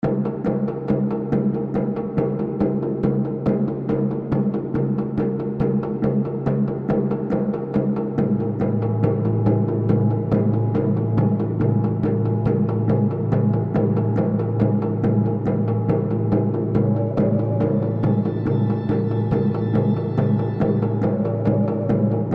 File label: ambient